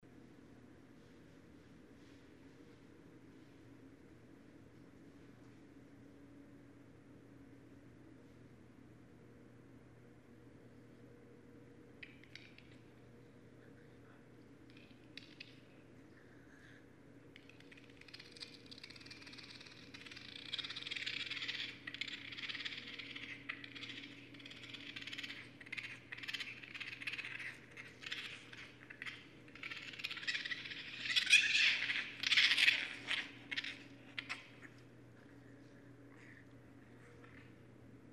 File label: Raccoon; Northern Raccoon (Procyon lotor); North America